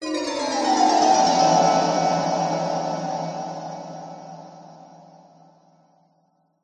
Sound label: horror descending bell chimes sinister weird